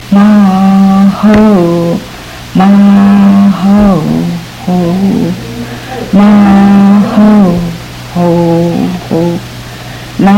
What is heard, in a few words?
walk back and forth rocking baby lullaby